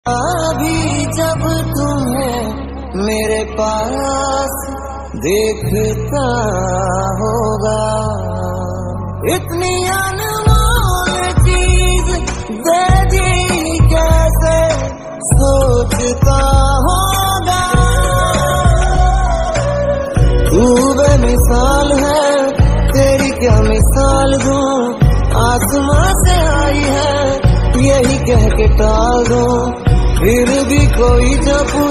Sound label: ringtone female